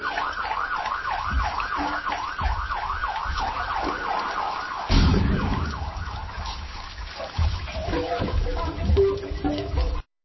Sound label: distant sirens